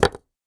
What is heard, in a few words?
Tile Place